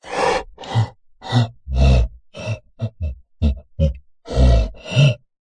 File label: horror monster creature breathy throaty friendly grunts